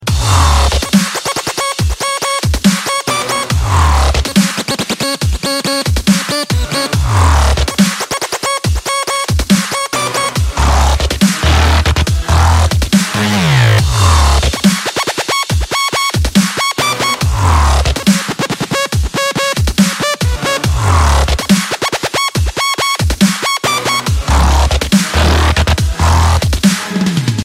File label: Dubstep